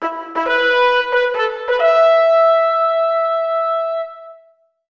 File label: Throne and Rug Fanfare; Barbie as Rapunzel: A Creative Adventure